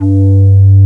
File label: S-LEAD; AKAI S-Series CD-ROM Sound Library VOL-8